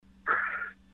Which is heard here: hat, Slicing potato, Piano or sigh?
sigh